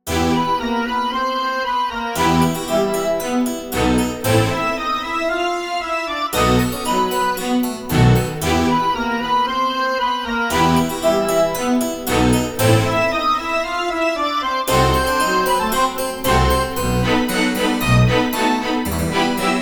halloween house loop

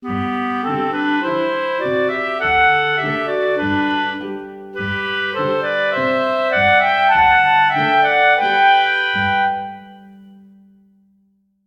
nohohon clarinet

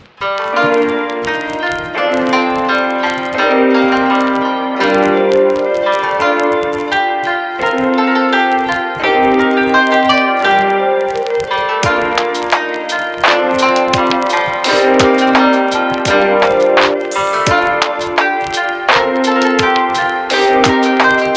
sakura night chill

